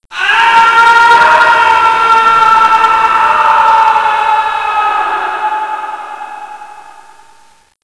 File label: falling to death; max